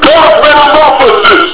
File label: dark metamorphosis